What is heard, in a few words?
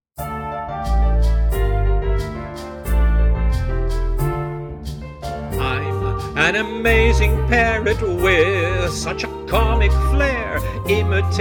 VOCAL